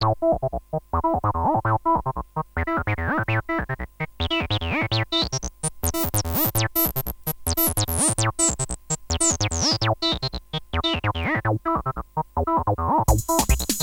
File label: square